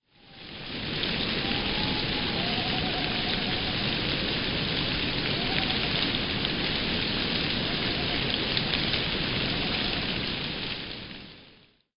aobato; chichibu

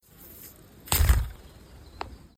kosamebitaki; Chichibu; Ishida Ken

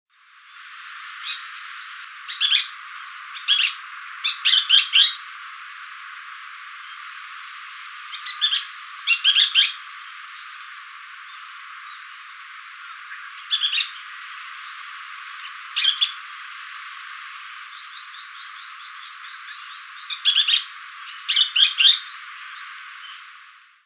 sankouchou; itoman

